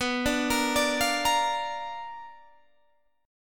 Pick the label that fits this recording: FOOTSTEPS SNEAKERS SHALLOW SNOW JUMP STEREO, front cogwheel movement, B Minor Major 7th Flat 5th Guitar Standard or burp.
B Minor Major 7th Flat 5th Guitar Standard